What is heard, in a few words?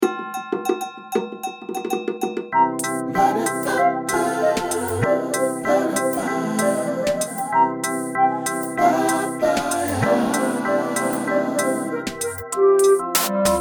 blues